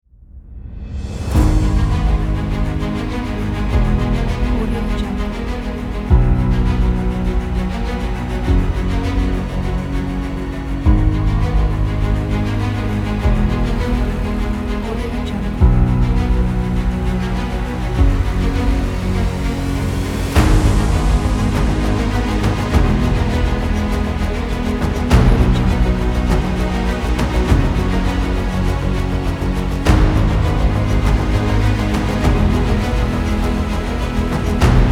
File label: epic cinematic